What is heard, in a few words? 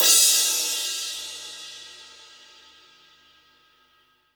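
cymbal crash; Best Service Gigapack 2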